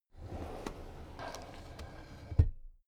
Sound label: drawer cabinet crammed close soft